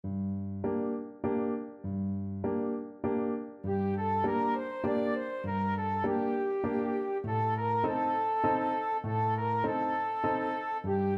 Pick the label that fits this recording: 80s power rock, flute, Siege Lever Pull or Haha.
flute